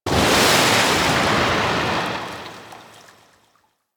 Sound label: Ocean Wave Crash Large; Warframe; Grineer Ocean